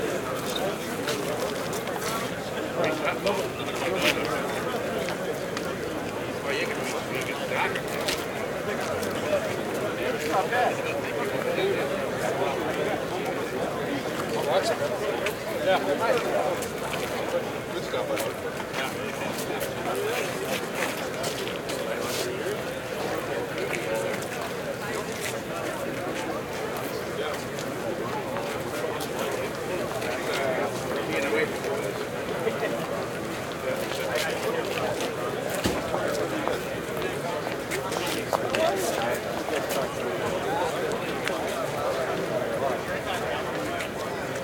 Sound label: crowd outdoors